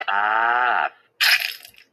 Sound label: fall; hotel